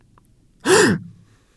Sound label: Expression Of Surprise